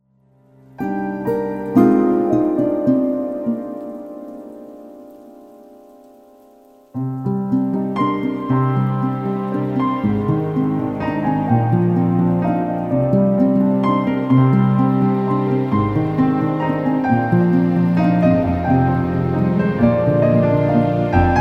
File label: Rain, piano